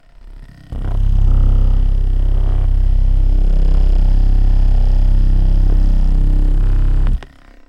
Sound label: Vibrations Bassy